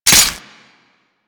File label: Adhesive Blast Latch; Weapon Mods